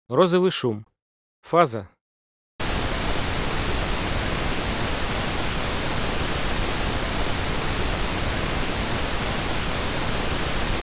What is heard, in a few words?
Pink phase